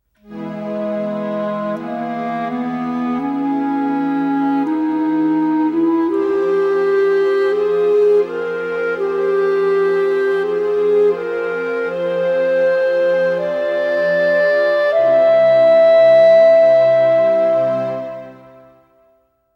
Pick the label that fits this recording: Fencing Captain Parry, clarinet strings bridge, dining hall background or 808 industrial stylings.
clarinet strings bridge